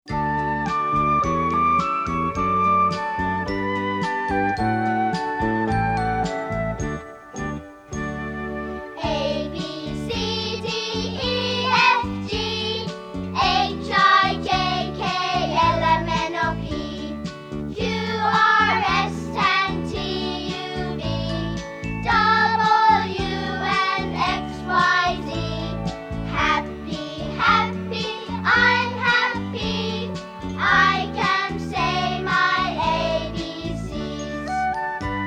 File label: song